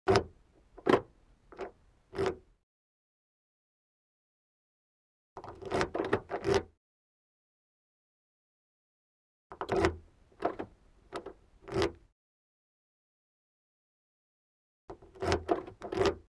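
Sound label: car door opening and closing with key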